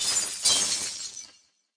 Ice

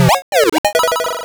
Coin; My Hero; System 1; arcade

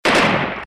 Shoot Submachine; Blockman Go; Android

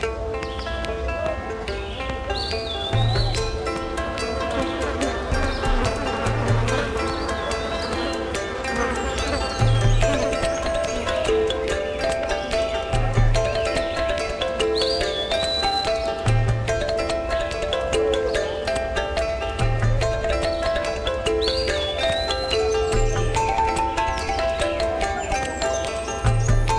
with garden ambience; Tintin Destination Adventure; Bonus Bones

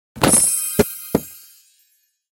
Gift Open; Candy Crush Soda; Treasureroad

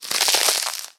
Ice Crackle

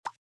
button click; out of reach treasure royale 2020 gamerip pc

